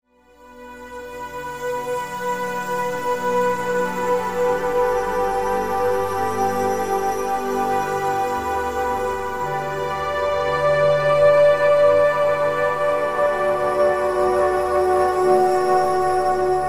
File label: Ambient